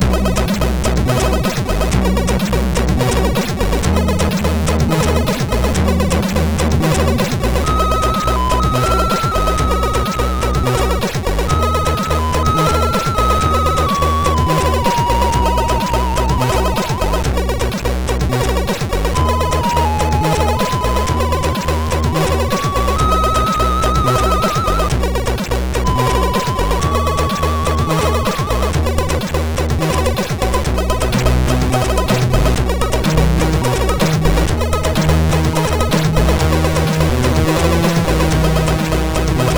Sad Goodbye sid MOS6581R4

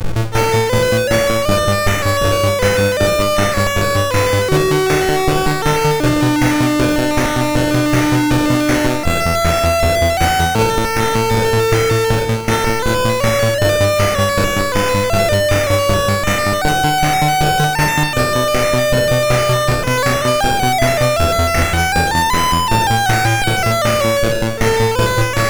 Synth Sample, MOS6581R3

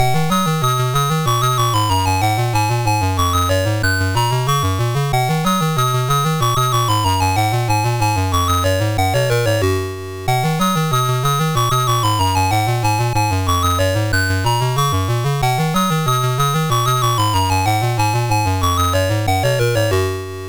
Quari, MOS6581R2

